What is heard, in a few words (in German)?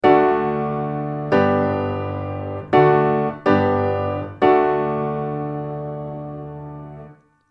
akkordfolgen